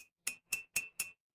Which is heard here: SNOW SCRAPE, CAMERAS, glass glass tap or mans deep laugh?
glass glass tap